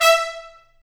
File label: BRS TP STA; Stabs-Swells; Roland L-CD702